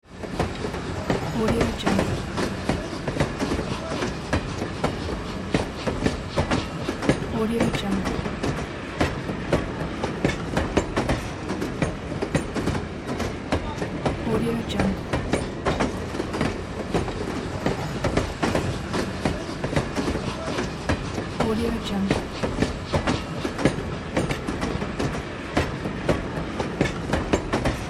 train

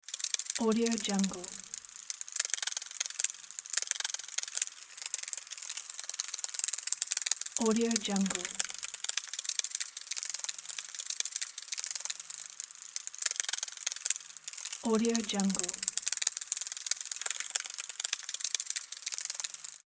insect butterfly fly

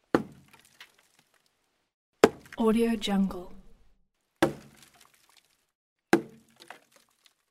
hit on old metal with falling debris

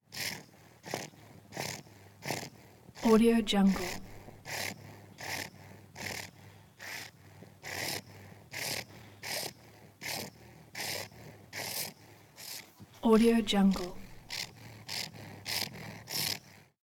creature wheezy fast pants purrs

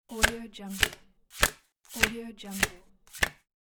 cards flips

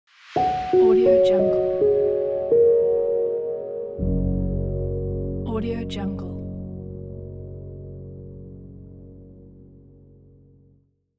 piano logo

